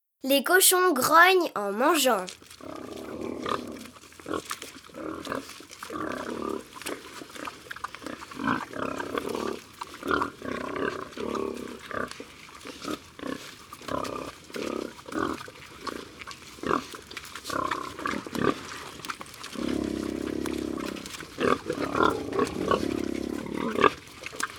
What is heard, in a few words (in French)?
Le cochon; mon imagier sonore de la nature